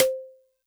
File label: XyloSnare DX100 C4